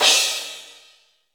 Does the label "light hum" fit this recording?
No